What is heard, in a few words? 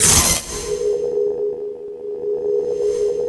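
BYRD STRIKE, HYDRA BLAST; Spectrasonic Distorted Reality 1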